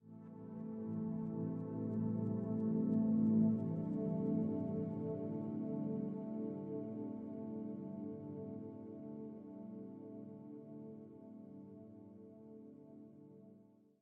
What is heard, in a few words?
Soft; Wordscapes; Android